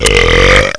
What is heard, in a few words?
BELCH